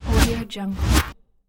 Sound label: swoosh